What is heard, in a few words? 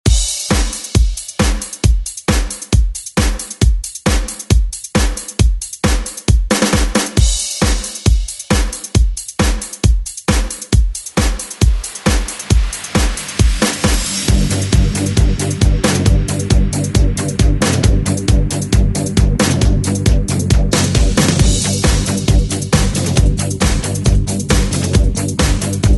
70s ReDrum